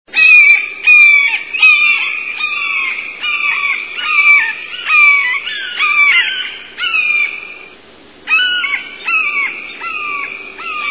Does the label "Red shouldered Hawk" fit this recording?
Yes